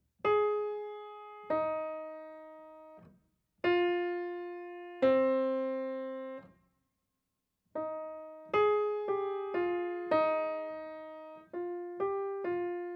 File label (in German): Glocken